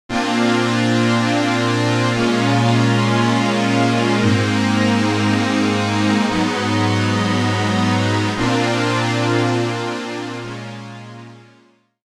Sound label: roland rs202 ensemble; gforce vsm